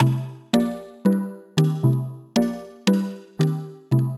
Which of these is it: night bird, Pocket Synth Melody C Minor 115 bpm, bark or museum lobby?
Pocket Synth Melody C Minor 115 bpm